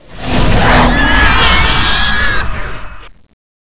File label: monsters spawn; oblivion darkangels